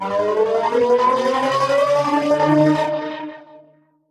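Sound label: alarm siren, modulation processed, 170bpm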